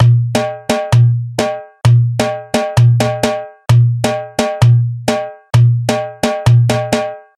beat lata louca MPC

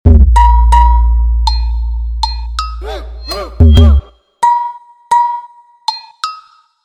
BEAT ESTILO DE BH

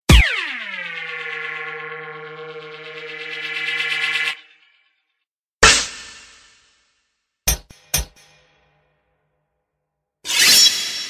efeitos

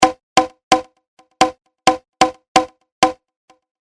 Base atabaque

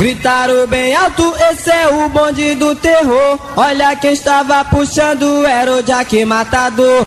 Vinheta

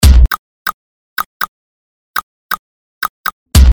BEAT LINGUINHA

